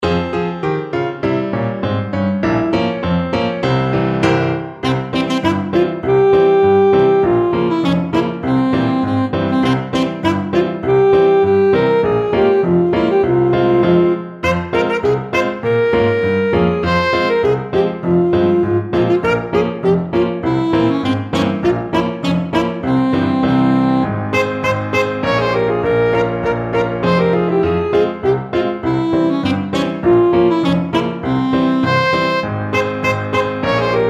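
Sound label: cuando el rey, alto saxophone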